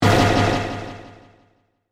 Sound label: Hit Fuzz Delay; Hits; Sound Design; BlastWave